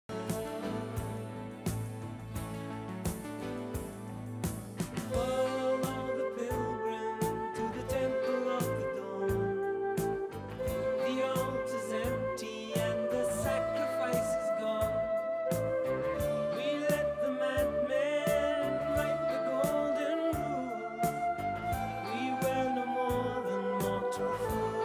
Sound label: Flute